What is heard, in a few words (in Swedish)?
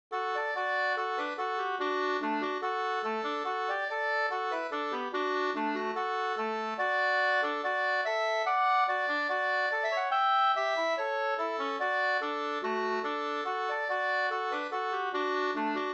ganglat; Majstangsmarsch e Arvid Lundqvist Fogdo Sd